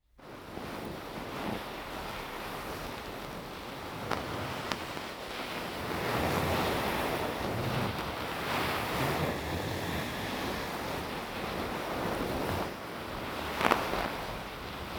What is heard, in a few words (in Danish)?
Pude med kamel, contact sounds